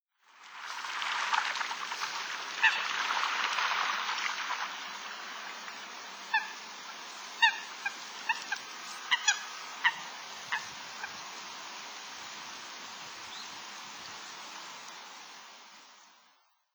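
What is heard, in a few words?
Eurasian Coot, watarase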